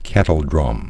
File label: kettledrum